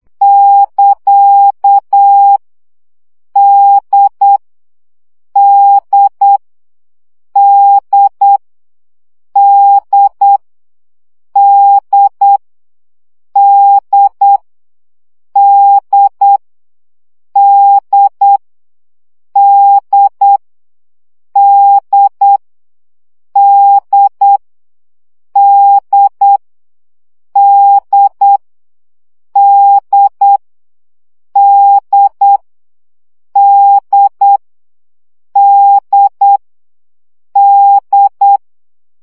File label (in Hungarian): CW gyakorlas d 7 baud